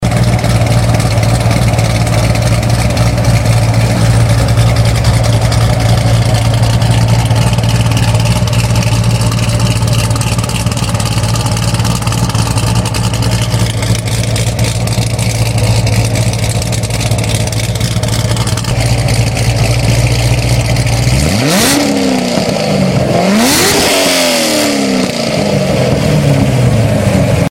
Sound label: zr1 engine